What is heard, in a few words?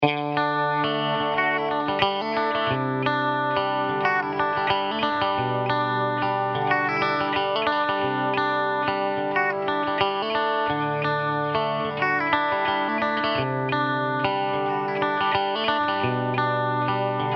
Pedal Steel Lick Backing